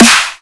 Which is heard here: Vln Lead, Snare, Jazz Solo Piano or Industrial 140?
Snare